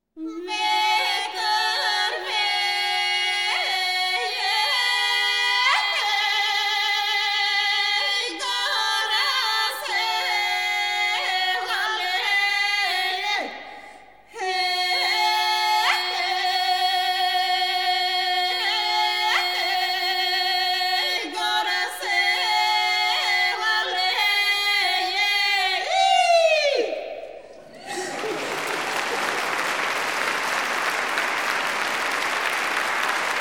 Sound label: Bulgarian Female Choir; WORLD MUSIC